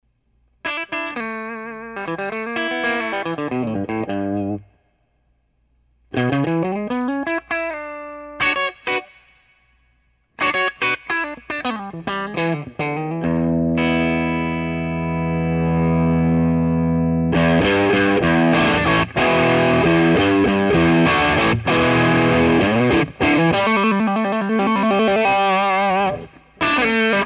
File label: strat; Strat- Fuzz Face only, using Volume knob